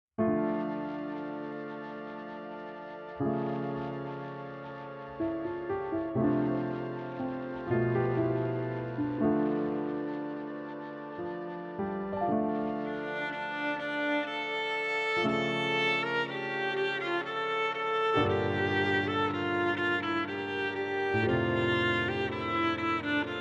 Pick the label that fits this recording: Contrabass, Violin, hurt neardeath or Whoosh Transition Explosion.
Violin